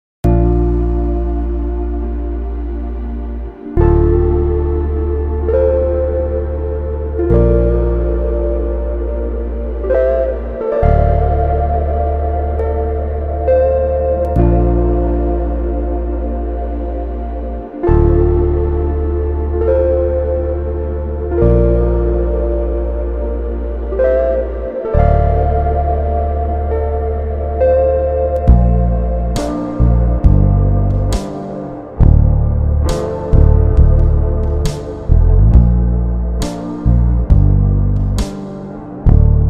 instrumental

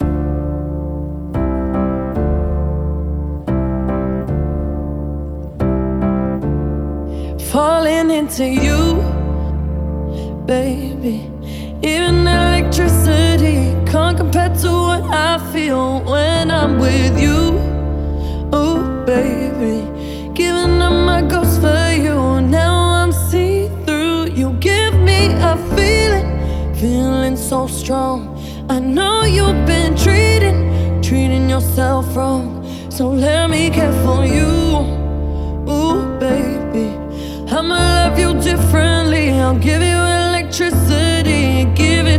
acoustic

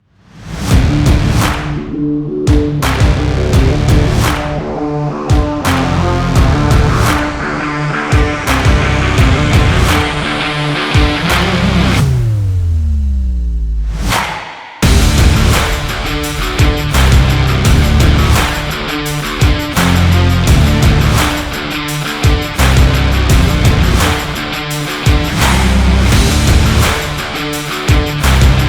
action sport rock trailer

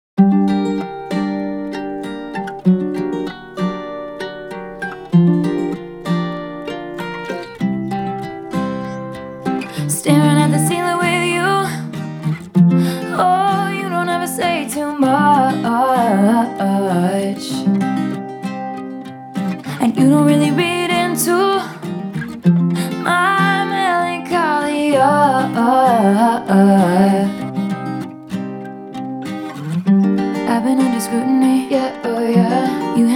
acoustic version

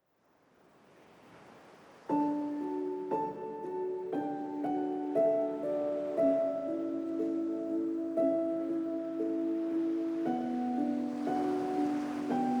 piano, enchanted ocean

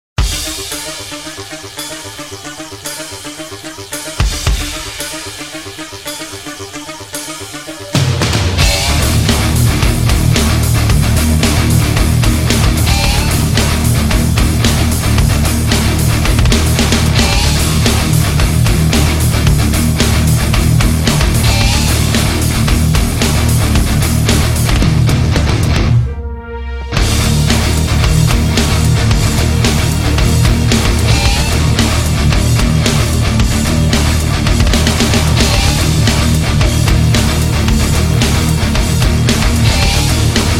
instrumental

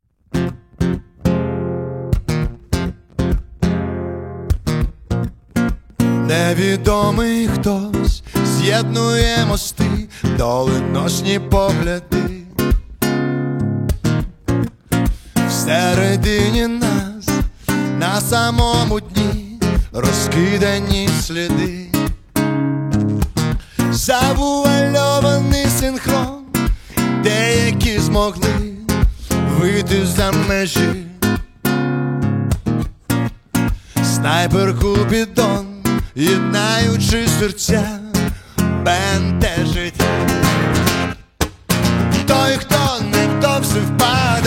acoustic live

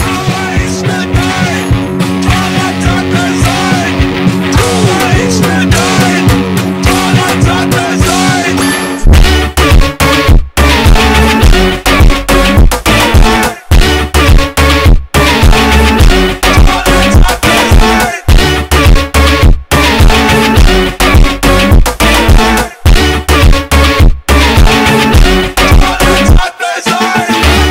funk alt version

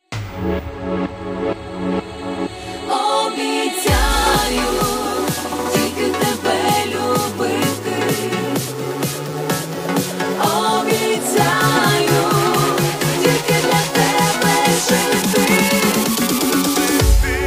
duet

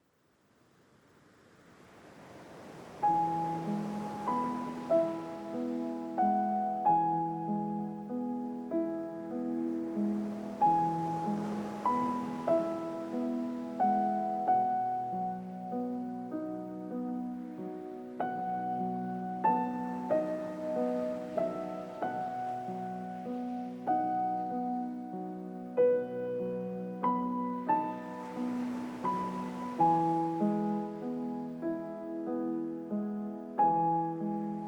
piano slowly drifting waves

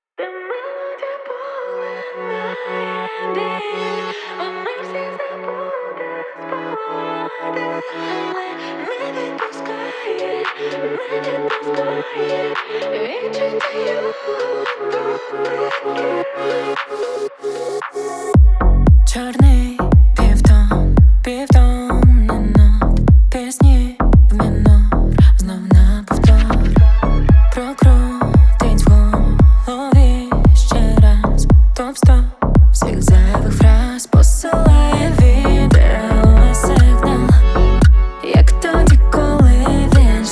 melody

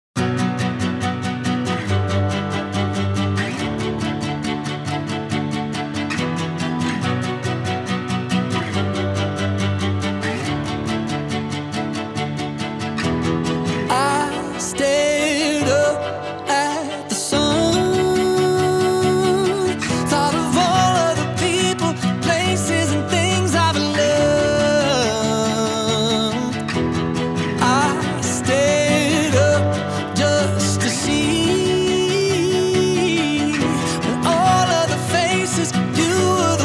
acoustic version

